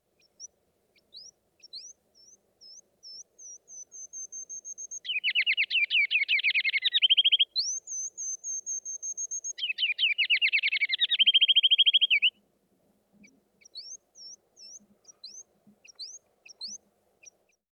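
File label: Scratch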